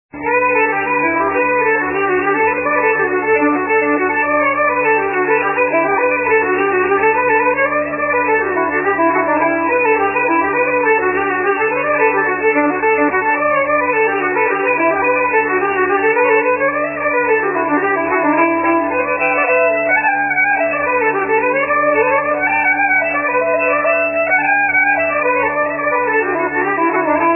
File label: lofi; Humours of Tullycrine / Mayor Harrison's Fedora (Hornpipe / / Reel)